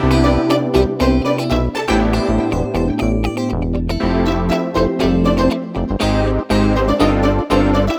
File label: Backing, 120bpm; Zero-G; Nu Disco; Nicengroovey C